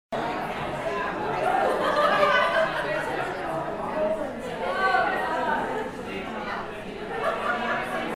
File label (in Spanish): ambiente del bar loop